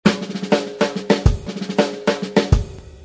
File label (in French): signal normalise 0 crete; Normaliser